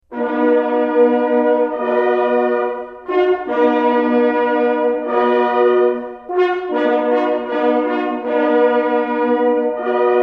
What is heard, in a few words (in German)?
Jagd vorbei